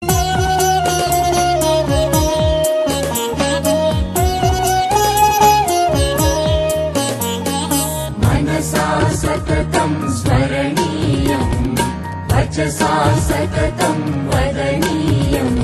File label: sanskrit ringtone